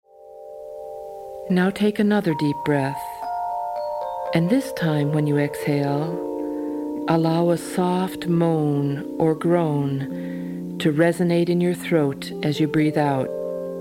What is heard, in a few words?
Groans and Moans